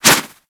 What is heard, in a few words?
Kubrow Melee Swipe